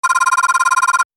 Digital interface scrolling menu ui

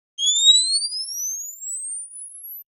Camera flash charge

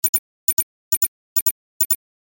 Data download beeps beeping

